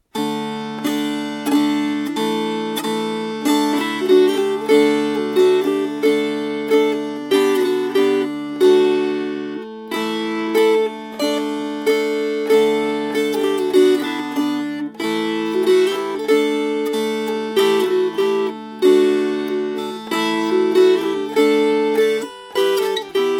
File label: Strummed; Dives and Lazarus